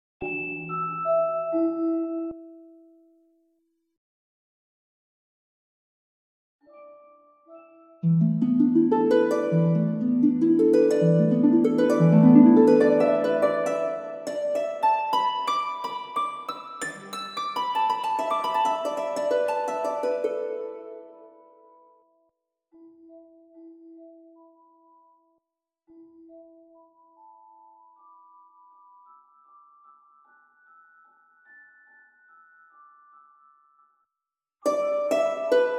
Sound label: Camp Harp A; Torchlight III; PS4